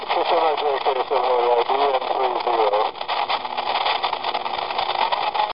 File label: laser id; optical comms; ka7oei